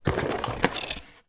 foley pizza box open; zapsplat

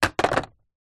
foley cup fast food empty squashed drop; zapsplat

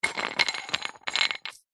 sport dumbbell collar spin tighten; zapsplat

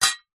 tin small lid set down

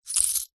coins movement hand

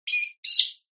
bird peewee call australia; zapsplat

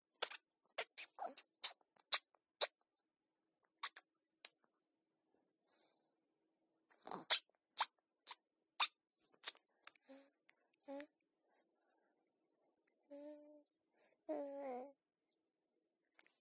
four months old baby boy breastfeeding; Sound Ex Machina

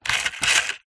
leisure rc toy car wheel turn motor plastic

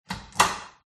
open door cupboard; fox audio

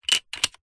leisure game plastic panel set down on game board; rubiks race

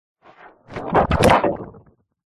science fiction movement slimy weird; zapsplat

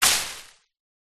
bushes leaves hit with wood post; zapsplat

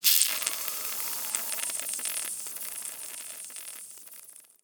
Acid Burn Sizzle; Blastwave FX

